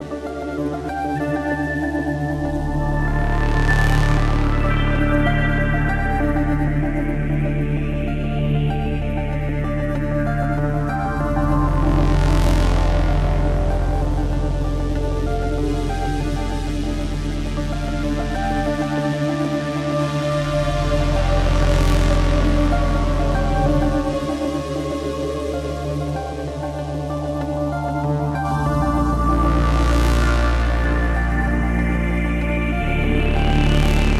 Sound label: Moon Patrol Pad Loop; Headlander